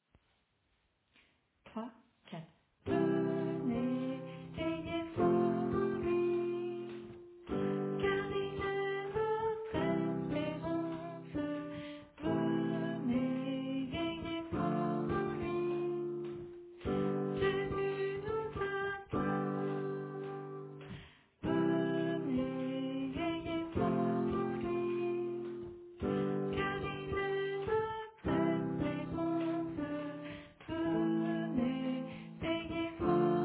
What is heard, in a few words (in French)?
Soprane